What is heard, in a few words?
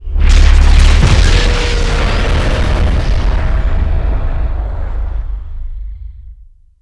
liquid tiberium, explosions